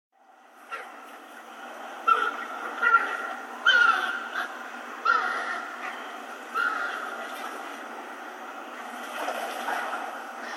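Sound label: kurotsura, Black-faced Spoonbill; クロツラヘラサギ; 地鳴き; 沖縄県豊見城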